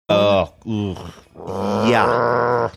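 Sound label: saying oh hoooogh growling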